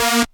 Synth Sound; Vengeance Samples; VEE Synth Shots

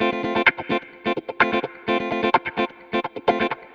Electric Guitar Kit F maj, 128BPM; Vengeance Samples; Special Sounds

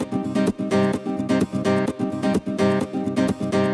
Nylon Guitar C min, 128BPM; Vengeance Samples; VEH3 Nylon Guitar Kit 2

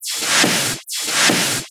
Loop 140 BPM; vengeance essential club sounds-3

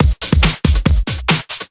Breakbeat 140 BPM; Vengeance Samples; VEC3 Loops 140 BPM

